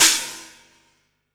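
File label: crash, Drums Acoustic; USB Soundscan vol.10; AKAI; DYNAKIT 2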